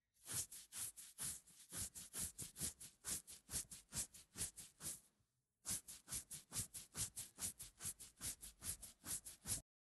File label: itch; خاراندن بدن